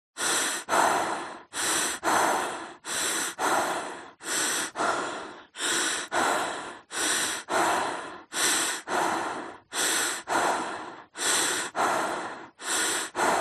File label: HUMAN BREATH Female Deep Sequence; smartsound